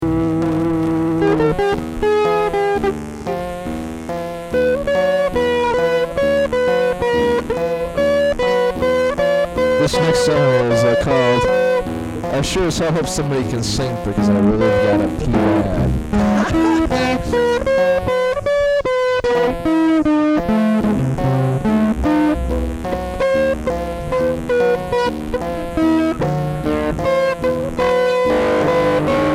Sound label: Harpsichord Duet